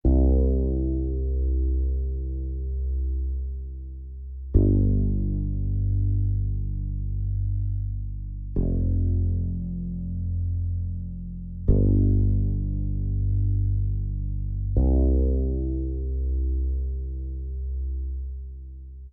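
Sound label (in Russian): фоновая музыка бас гитары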